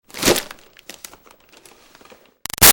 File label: Foley Box Hit Xmas Present; Crinkle; Hits; Objects